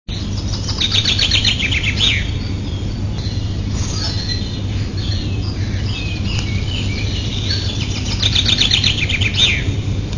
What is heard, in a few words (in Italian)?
uccello